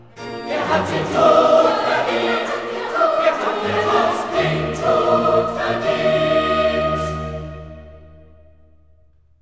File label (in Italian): Coro; Er hat den Tod verdient; Handel; Brockes Passion